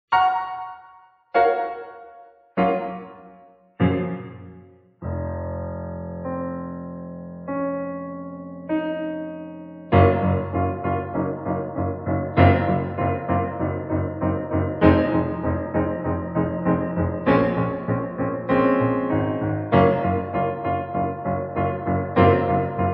Black Water Reverb

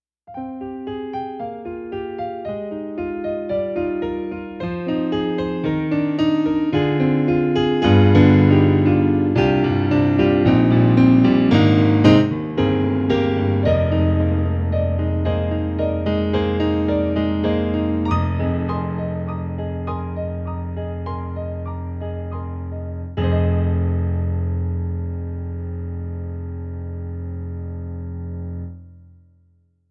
Soft Stereo Piano

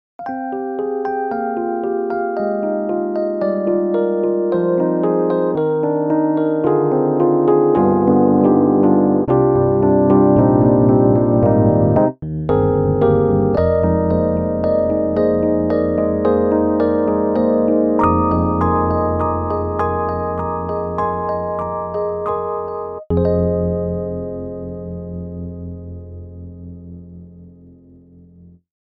ePiano; MDA

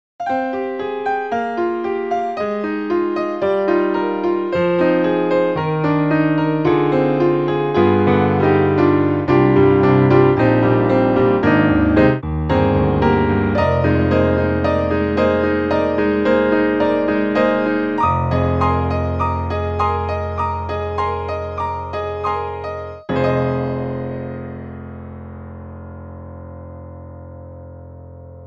Steinway Grand Piano; VSampler ProSamples

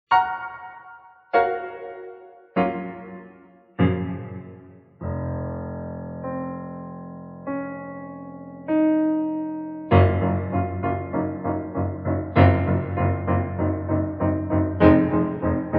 Waves IR

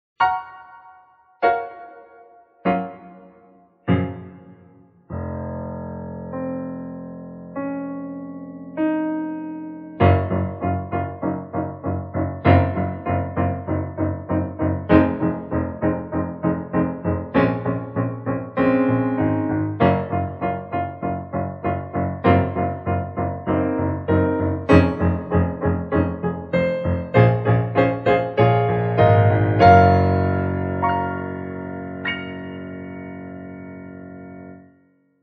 Classic Reverb

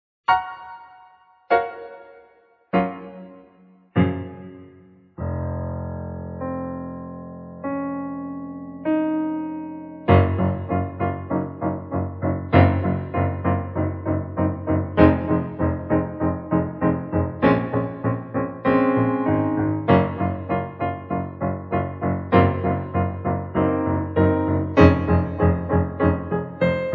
Soft DX Reverb; ANWIDA

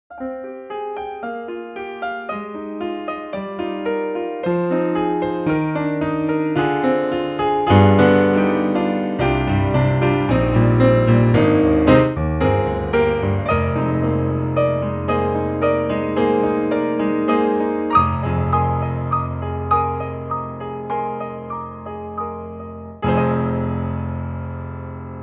Emu X OLD LADY

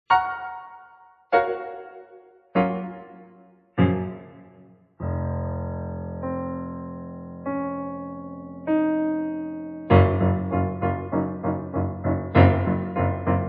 Waves R Verb

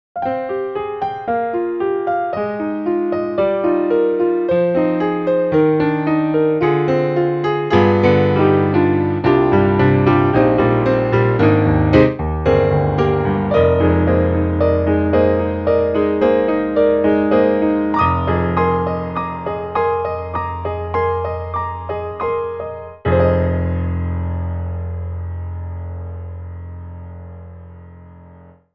Steinway

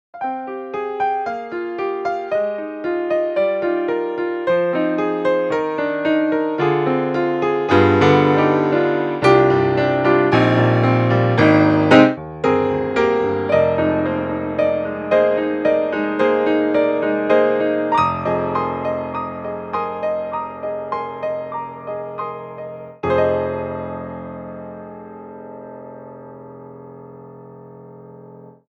Grand; EmuX Maestro

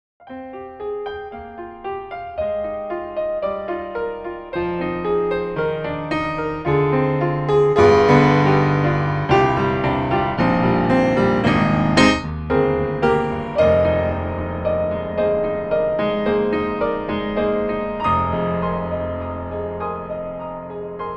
TASCAM CV Piano

